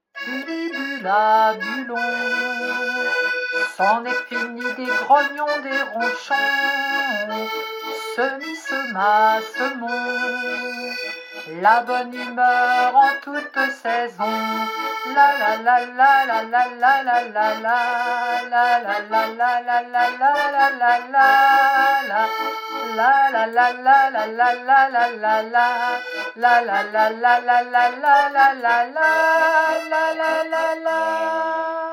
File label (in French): Bulli bulla bullons chante; animation a l'ecole